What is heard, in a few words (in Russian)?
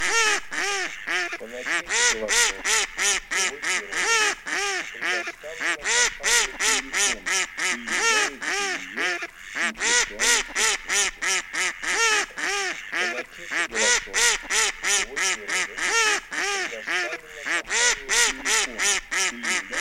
Кряква Germano